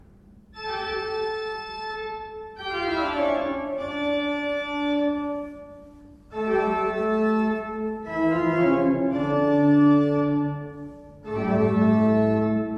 pipeorgans; Euler 10 II P

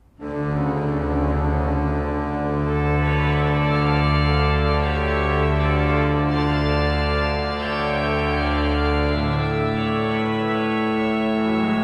pipe organ; Seifert Koeln 32 III P

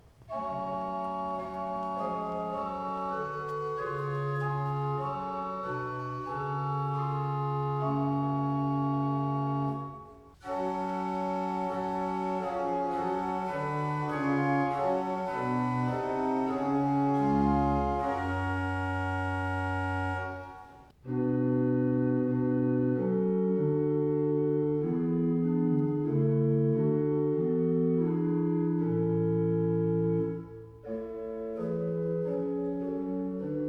Wegscheider, pipeorgans

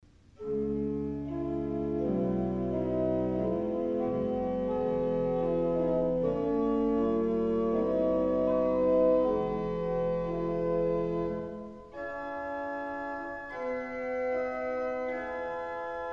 pipeorgans; Mertel

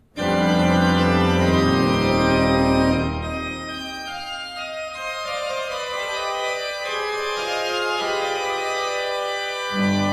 Fuehrer, pipe organ

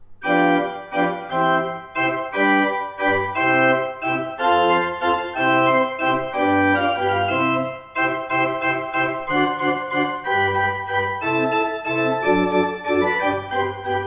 pipe organ

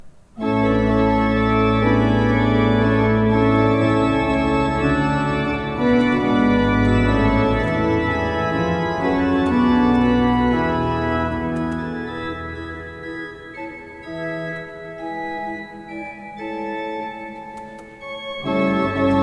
Niederlaendische Orgel

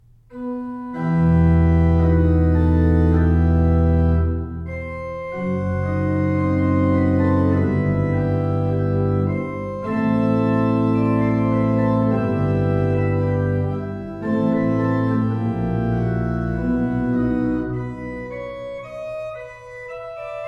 pipe organs; Breil 19 II P

